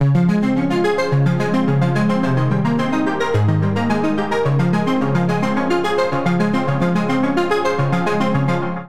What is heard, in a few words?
Arp Ab Major 108 bpm; Accompaniment; Tonal; MIDI Clips; Past Arp; Drive and Glow; Ableton; Factory Packs